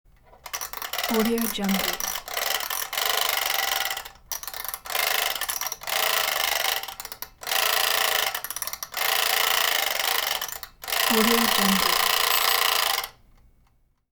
retro alarm clock

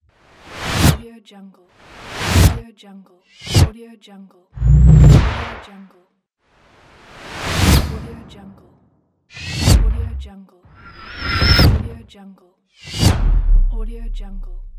sweep

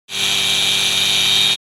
construction machine saw compact free

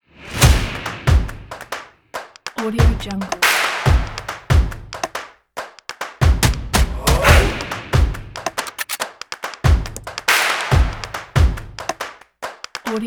action sport energetic stomp percussion

